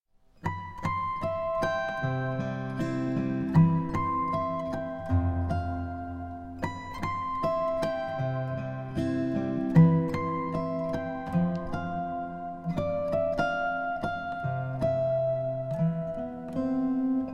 acoustic instrumental solo

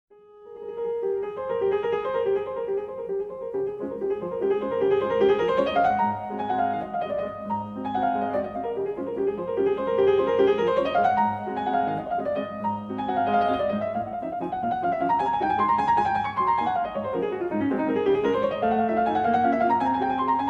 Minute Waltz, Pizzicato; Chopin; Clasica

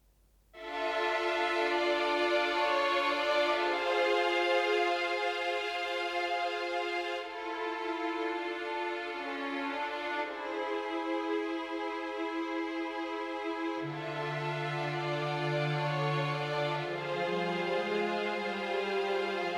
Vintage Waltz

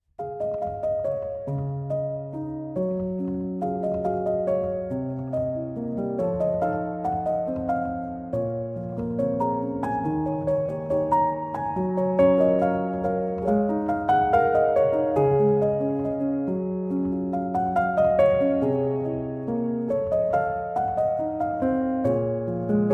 Piano Instrumental

